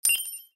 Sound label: Coin; Aporkalypse Pigs of Doom